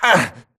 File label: grunt hits; Titanfall 2